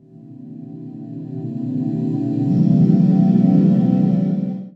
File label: Atmos, Atmospheres; Akai; Mule